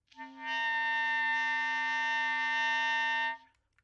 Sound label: noise